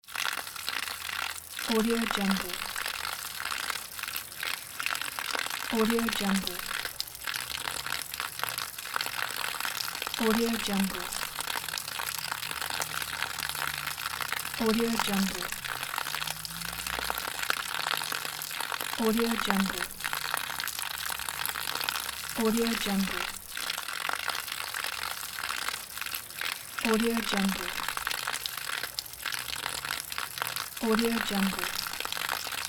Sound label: water dripping from gutter